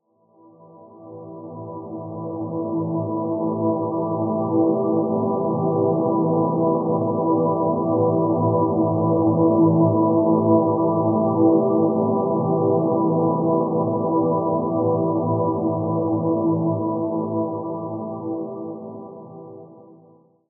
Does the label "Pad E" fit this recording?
Yes